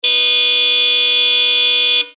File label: super tone horn b133 twotone; hella